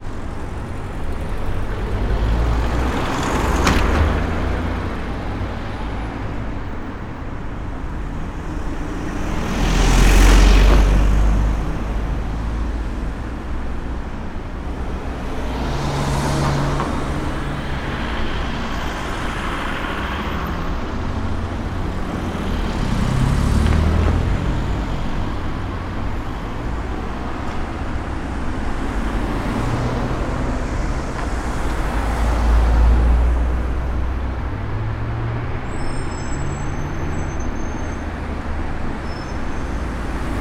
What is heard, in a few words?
ambience urban traffic town quiet; sound effects one